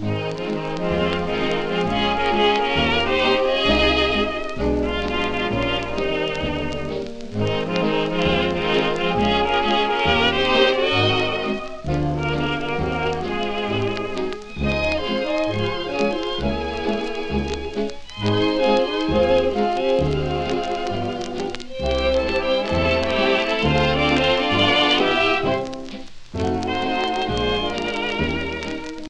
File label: Shop music